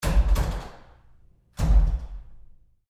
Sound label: Door Bathroom Stall Handling Roomy Rough Short; Bathroom Sound Effects; Big Room Sound